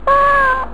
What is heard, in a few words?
nyah; 射的 1.2 68K; 子供向けゲーム